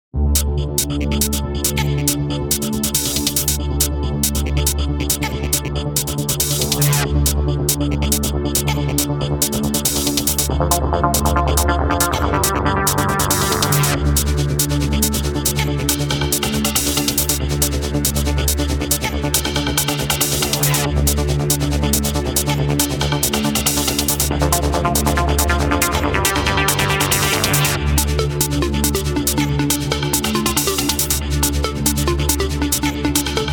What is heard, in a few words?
A House Music Beat